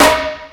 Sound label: All Snares, Drum Machines